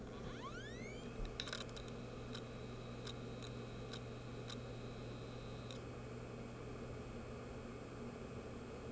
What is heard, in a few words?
hd sounds, laptop, bad; mac disasters